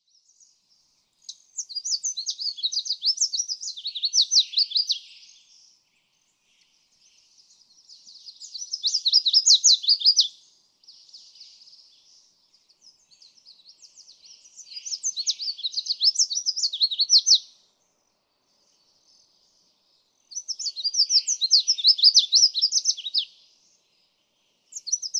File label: Red-faced Warbler